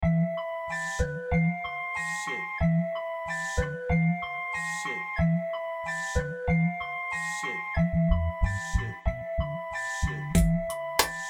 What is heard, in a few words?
Remix Instrumental